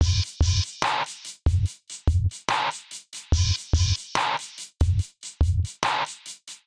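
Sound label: Bouncy (C minor) 144bpm Straight Beat 8th; Ableton; Factory Packs; Samplification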